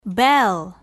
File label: bell